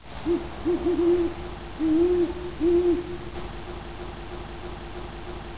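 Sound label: Japanese owl; cs hokkaido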